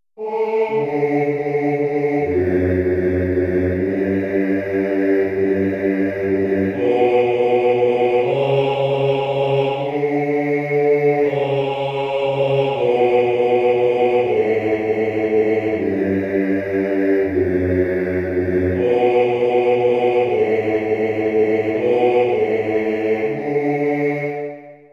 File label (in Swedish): bas; I vinden; tacksagelse